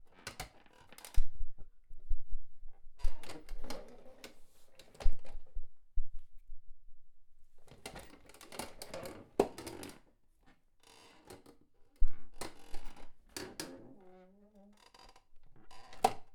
Office Chair

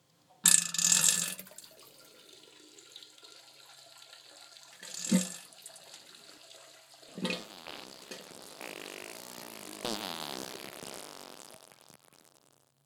Pooping Farting Some Peeing Designed Exaggerated

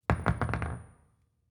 Metal Dropped Clean

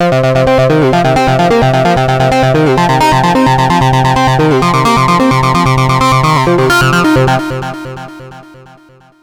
Bass loop 130 bpm B flat; Lunatico

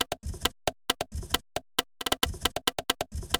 135 Bpm Loop

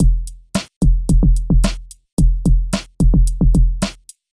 Sine Hop 110bpm

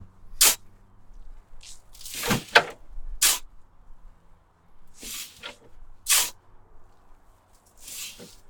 Shoveling Sand

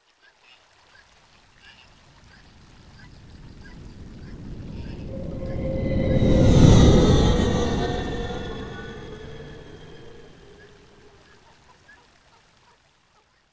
Forest planet flyby

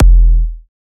Big Room Kick Low

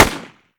AK47 Shot

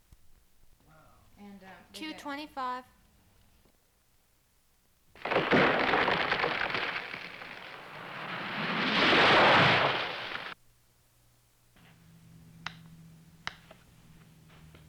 Tree Falls And Hits Ground

